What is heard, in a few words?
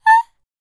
Vocal, R&B & Dance, FEM. HUMAN